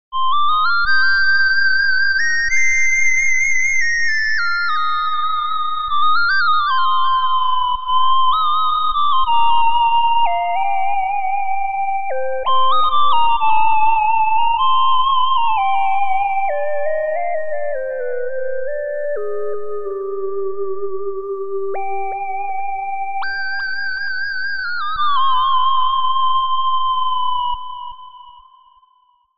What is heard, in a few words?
Flutissimo Lead